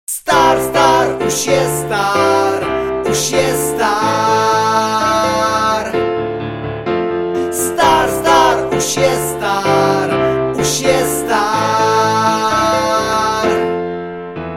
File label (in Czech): klavir